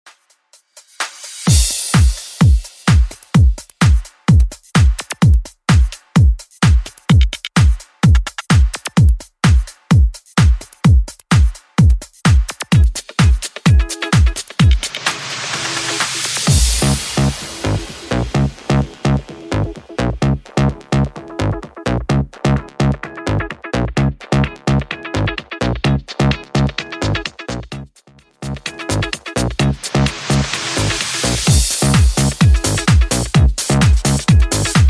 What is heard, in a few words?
80s Dancemix 128bpm